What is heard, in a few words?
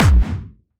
Medium, kicks